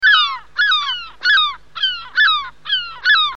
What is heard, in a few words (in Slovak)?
Cajka; Revelation Sight and Sound SK